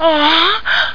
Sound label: UHH